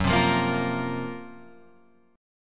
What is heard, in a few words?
F sharp Major 7th Flat 5th, Guitar, Drop D